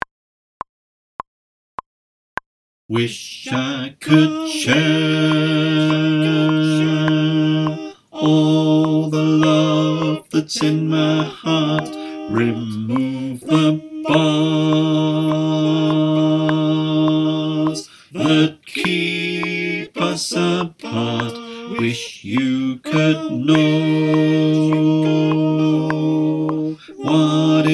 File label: bas clicktrack, choir